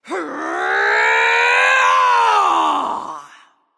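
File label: battlecry, female; smite; voicepack; hera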